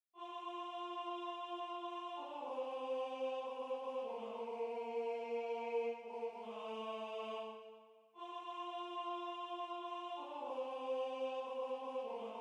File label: na nda tenor